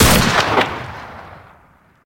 shot, weapons; f2000; gmod; fokku tc f2000

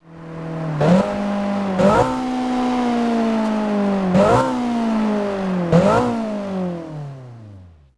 throttle off, vehicles; gmod; tdmcars; hon crx sir

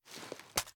ar akilo47 drum reload empty fast rotate; weapons; viper

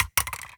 phys mag drop smg metal concrete, magazine drops

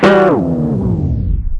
stop, vehicles; blista

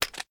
reload empty fast end; reloads; gmod

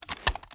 sm uzulu reload xmag fast start; gmod; iw8

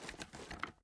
reload empty fast start, weapons; gmod; viper

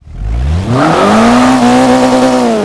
first, vehicles; gmod; tdmcars; xbow

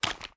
raise settle, weapons; gmod; viper

